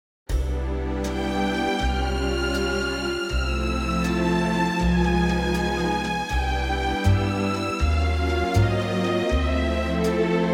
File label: C orchestral